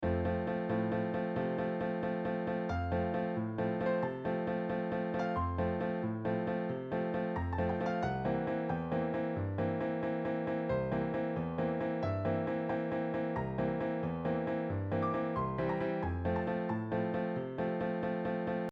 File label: Piano; Wolfgang Amadeus